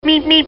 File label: meep